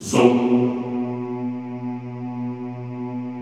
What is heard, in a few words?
ZOOMMM B1, Classical Choir; Best Service; Extended Classical Choir